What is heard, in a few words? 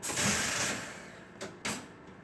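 emergency brake, R62 Kawasaki, Train; OpenBVE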